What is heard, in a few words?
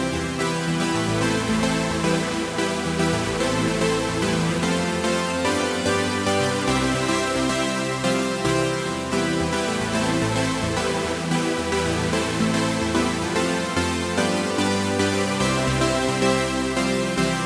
supersaw pad after